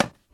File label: hit general; Timelock VR